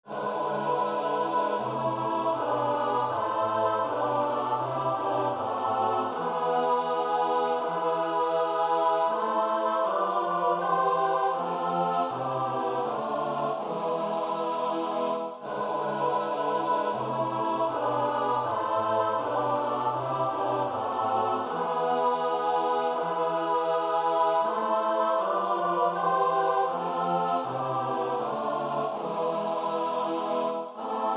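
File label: soprano alto tenor bass; Bach (Johann Sebastiaan); BWV 038; 6 Ob bei uns ist der Sünden viel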